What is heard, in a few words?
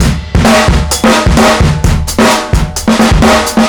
130 full, metal drum samples drums acoustic 130bpm; musicradar-metal-drum-samples